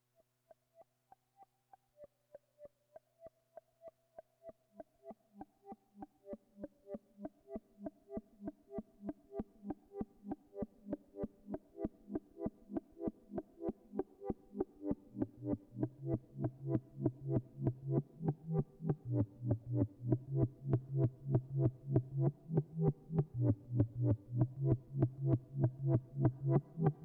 Subharmonicon MELLOW HARMONIES

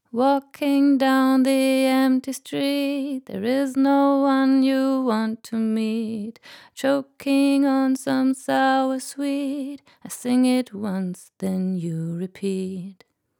Vocal EQ Solid State; Gyraf Audio G14-S

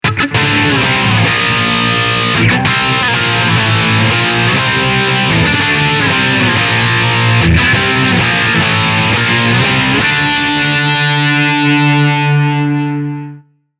heavy

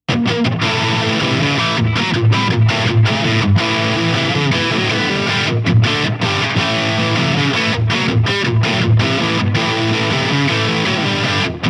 Ibanez RG 550 DY Pickup 1 5 Crunch

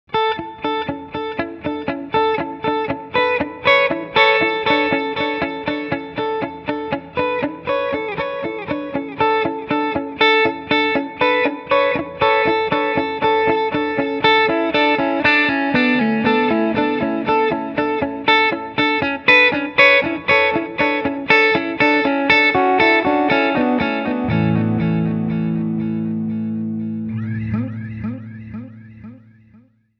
delay

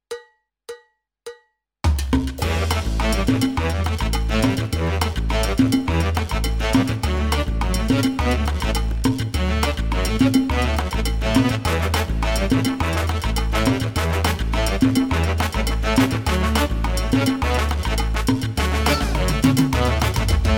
Korg PA4X Style

